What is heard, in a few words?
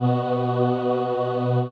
chorala